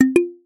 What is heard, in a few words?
error